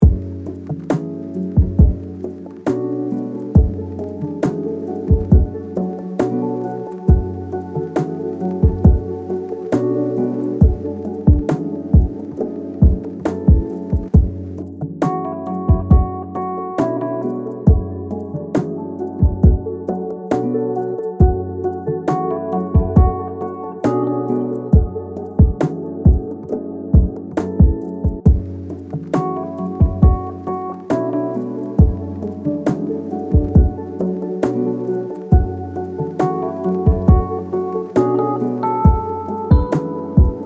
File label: Vintage Hourglass Lofi lounge